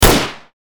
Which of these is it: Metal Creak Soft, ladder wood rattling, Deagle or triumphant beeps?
Deagle